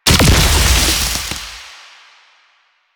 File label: grenade explosion; Warframe; Lasrian; Lasrian Soldiers; Weapons; Lasrian Goonadier Grenade